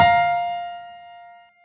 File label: Two F Bb5; osu